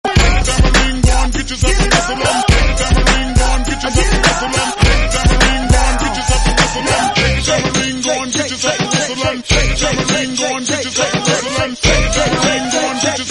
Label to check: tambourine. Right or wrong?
Right